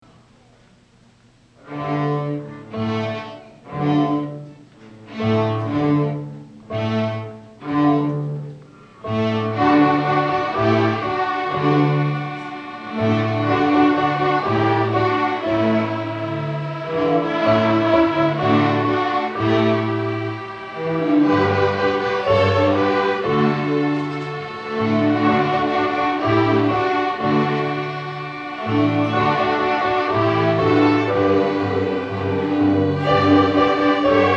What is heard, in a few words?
tango latino